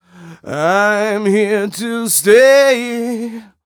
m 127 mel a, Vocals

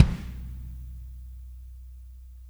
AMB KIK; kick; Spectrasonics; Burning Grooves

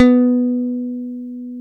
B 1, PICK BASS; AKAI S-Series CD-ROM Sound Library VOL-7

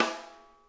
RIM, snare; Sonic Reality; Interactive Drum Kits

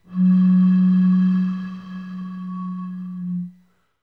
PRC BOTTLE, Bottle Blws; Roland - LA Composer vol. 2

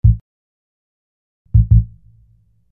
Sine Bass

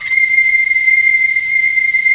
STR C6, ENSMBL; AKAI S-Series CD-ROM Sound Library VOL-8

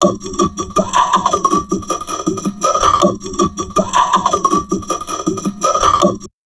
TALK, Drum Loops Crazy Processed

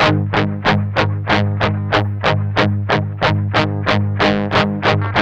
MED ROCK, Guitars; Spectrasonics; Hans Zimmer Guitars VOL-1; 102-MED ROCK

